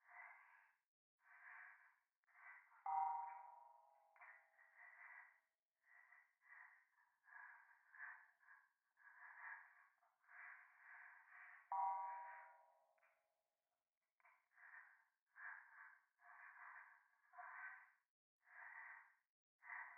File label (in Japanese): 夢の中 右耳快感吐息 寺